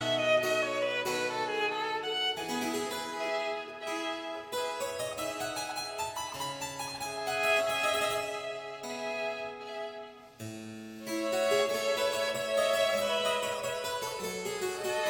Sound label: sonate nr 3 e dur